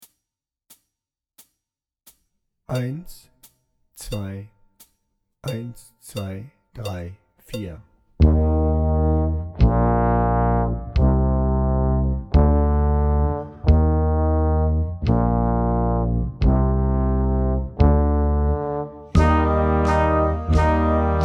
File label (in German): langsamer, brass